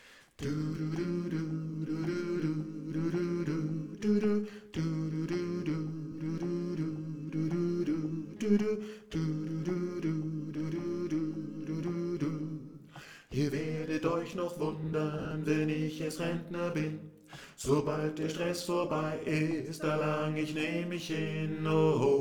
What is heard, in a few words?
bass